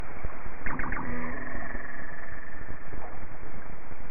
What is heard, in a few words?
guitarfish; acoustics